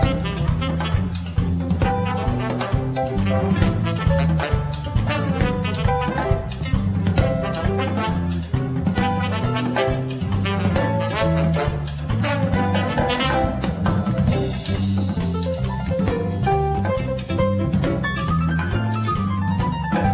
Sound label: Merengue